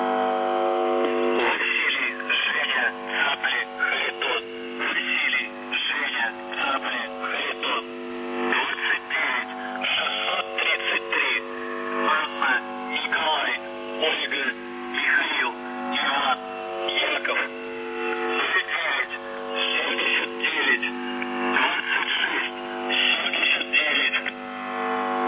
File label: buzzer usb msg